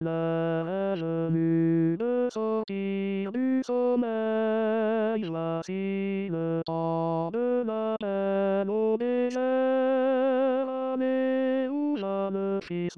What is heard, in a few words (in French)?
tenor